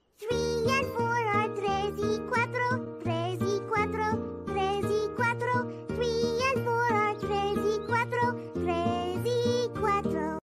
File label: Singing